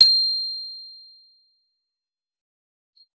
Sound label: guitar electronic, clean electric guitar